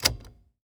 Transportation Tape Player In Full Size Van Button Pressed On